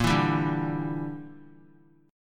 A sharp 6th Flat 5th; A#6b5 strummed; JGuitar

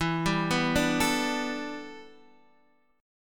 E Minor 11th guitar chord, Open D

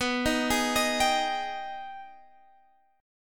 Ab Minor Major 7th

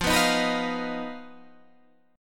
D sharp Suspended 2nd Sharp 5th F

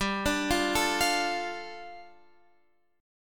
G 7th Suspended 2nd G, Guitar Drop D; down

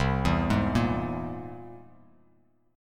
C Augmented 7th, Bass Standard, chord; arpeggiated; JGuitar

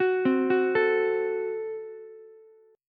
Gb Diminished, Ukulele, Gbdim chord; arpeggiated; JGuitar

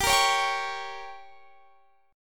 G Diminished, Guitar Standard; strummed; JGuitar

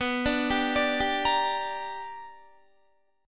B Minor Major 7th Sharp 5th Guitar; BmM7#5 arpeggiated

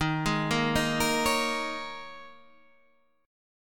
D sharp 7th Suspended 4th, Guitar, Standard; D#7sus4 arpeggiated; JGuitar

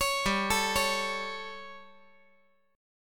G Suspended 2nd Flat 5th G, chord

